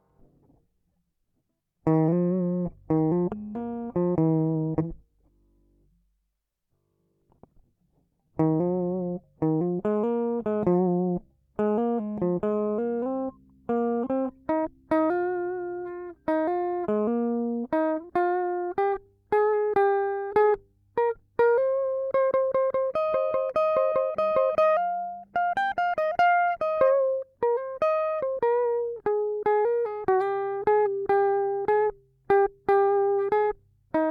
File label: solo guitar (first bit is mistake)